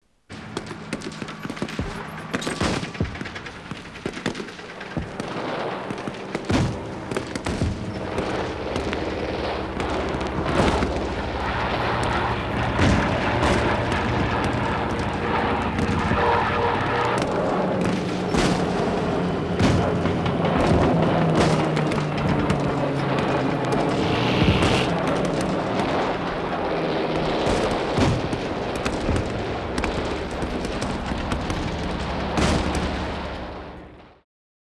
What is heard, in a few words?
Gorilla Warfare